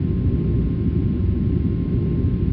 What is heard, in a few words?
underground hall loop, ambient atmosphere